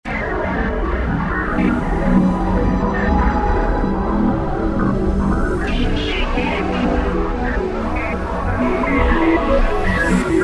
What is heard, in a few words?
White Hole, Roland JV-2080